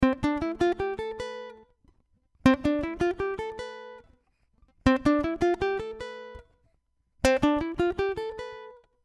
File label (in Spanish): C Escala Completa